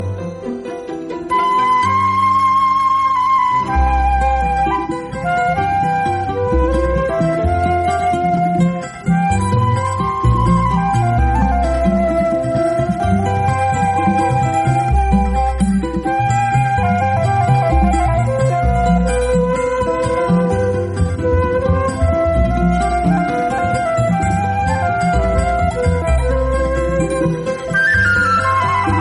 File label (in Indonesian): flute keren nada dering